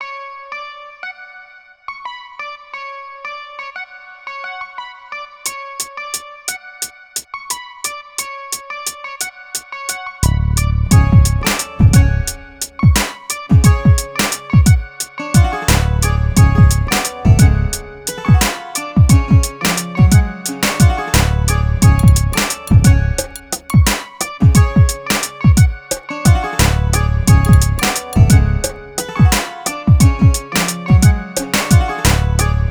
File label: 088 C#min, Hip Hop; Hip Hop Exotica; Apple Loops; Big Fish Audio